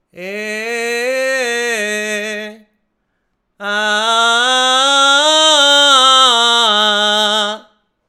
Belting male

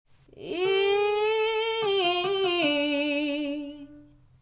Riffs

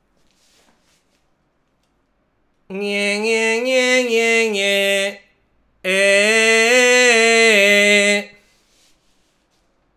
Twang nasal male

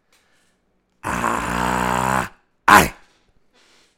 Distorsion male

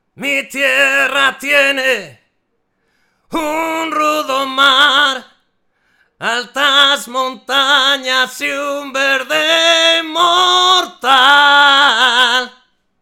Distorsion male

